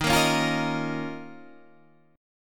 D sharp 7th Suspended 2nd; D#7sus2 strummed

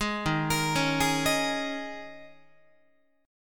Eb 7th Suspended 4th Eb, Guitar; Eb7sus4; arpeggiated

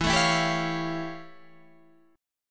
F Augmented Major 9th E

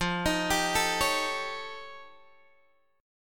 F Augmented 9th F Guitar Open G

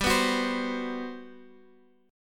G Major 11th; strummed; JGuitar

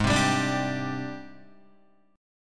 G sharp Major Sharp 11th, Guitar; G#M#11 strummed; 3,3,7,7,7,3; JGuitar

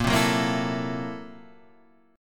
Gb 7th Sharp 9th Flat 5th Bb, guitar

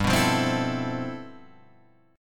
G 13th G, chord

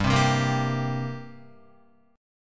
G Minor Double Flat 5th, Guitar; strummed

